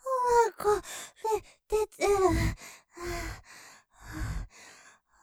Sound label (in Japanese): 絶頂余韻, ASMR; 立体; ステレオ; おまんこ出てる; ループで楽しむ！シェフの気まぐれ抜き抜きコース